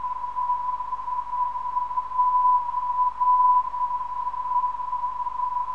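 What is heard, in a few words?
TA 752kHz, non-directional beacon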